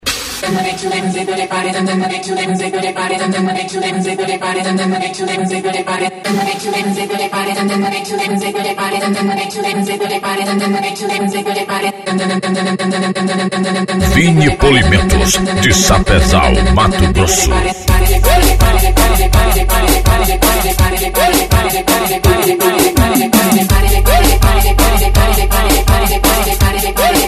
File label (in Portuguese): funk e rap